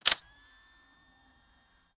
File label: camera